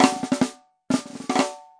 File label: marching snare rolls, drum loops and breaks